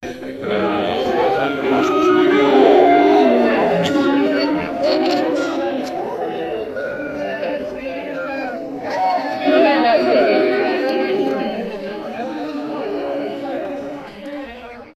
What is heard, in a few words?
600 goblins show up to; animated